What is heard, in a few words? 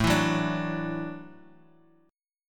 A 6th Suspended 4th, guitar; A6sus4 Chord {5 7 4 7 X X} strummed